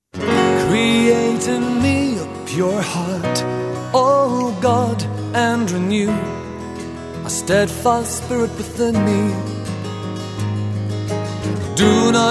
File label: Piano